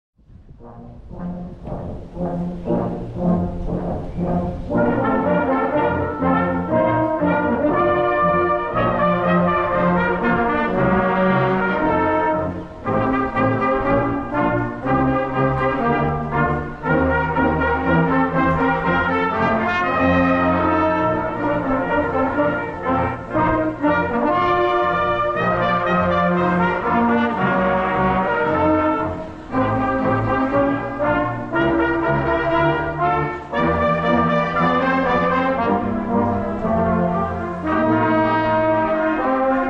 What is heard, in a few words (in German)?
Tanz der Rohrfloeten